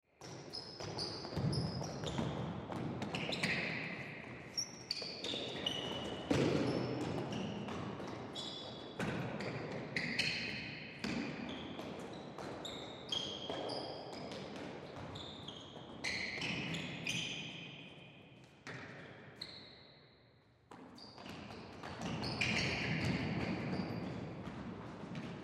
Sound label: Gym Shoes People, Gym Shoes People Run Around Scuff Floor; Sports; Blastwave